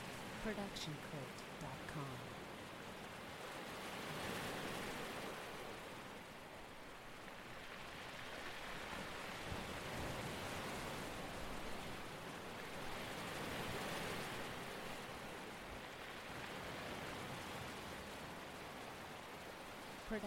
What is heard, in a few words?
loopable waves on pebbles; soundscrate